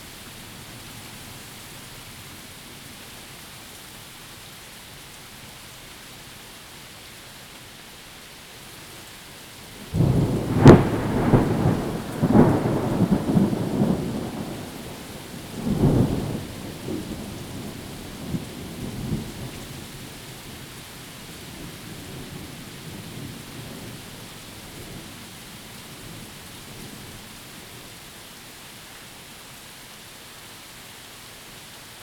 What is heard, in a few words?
thunderstorm